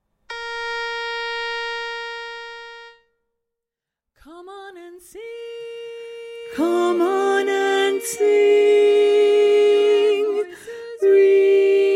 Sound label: baritone predominant, chorus